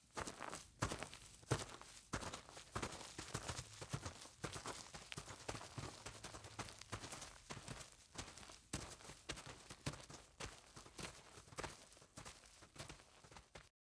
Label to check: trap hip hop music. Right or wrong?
Wrong